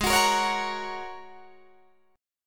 G sharp 7th Suspended 2nd Suspended 4th G sharp Guitar Drop D